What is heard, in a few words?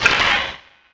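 alert; flyclaw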